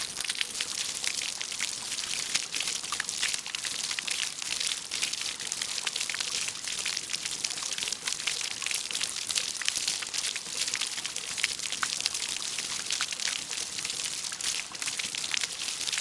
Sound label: fountain small splashes of multiple squirts on the ground close; sonniss gdc